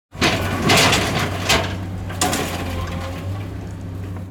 scrapyard hard junk machi; nova; nova sound

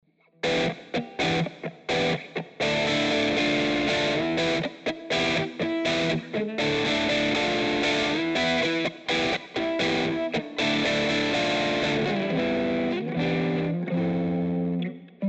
rough riff, guitar amp; cross the bridge guitar amp suite; marco dodin

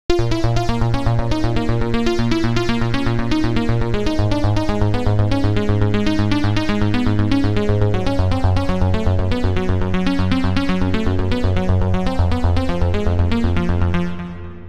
actinium arp; the space soundset

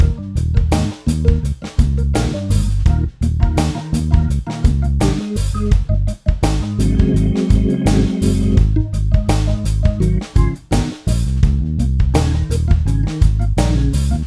organ; funkastic; sampleson

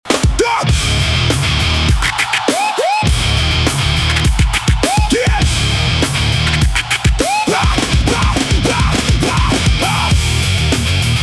rock streaming loud; transientik master; transientik labs

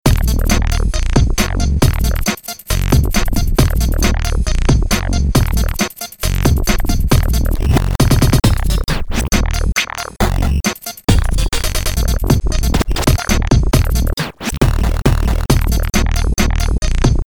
crumble bass; audioblast

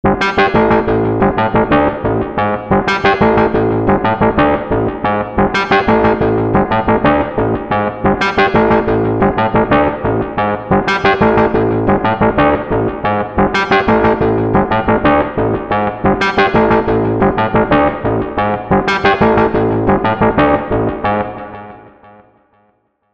clavichord

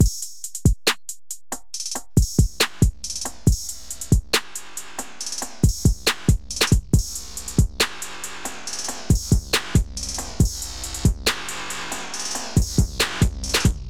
hip hop drum loop; septimbral

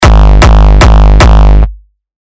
kick more hardstyle crunch

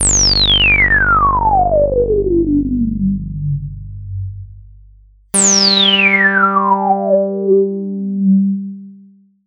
Steep Res Sweep; Saurus

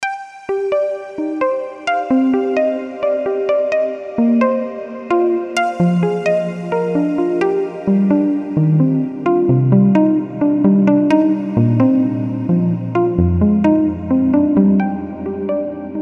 ping; amaranth audio cycle v1 5

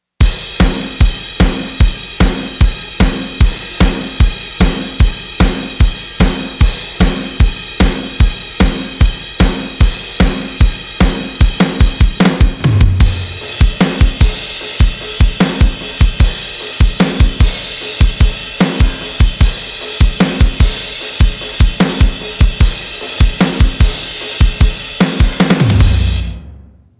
only drums; df xcite; drumforge

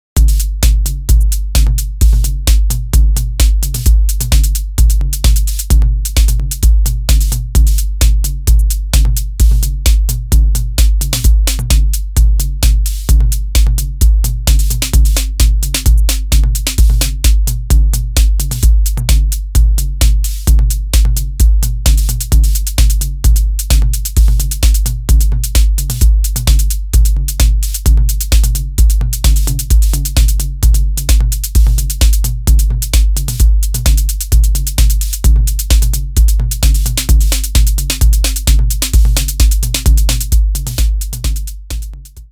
808 organic, acoustic drum machine; ac-dr acoustic drum machine; soniccouture

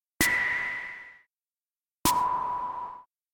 snares

